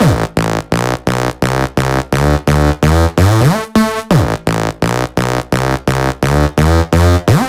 128BPM Melody; Vengeance Samples; Breaker Kit

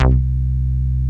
Basses Root C; Vengeance Samples

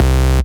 Bass Cuts; Vengeance Samples; VES1 Instruments

